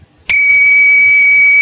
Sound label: carrier noise